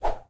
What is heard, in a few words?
Woosh Short, Light